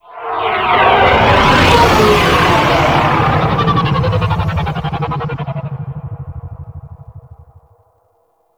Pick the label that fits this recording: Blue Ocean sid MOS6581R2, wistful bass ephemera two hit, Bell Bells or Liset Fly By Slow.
Liset Fly By Slow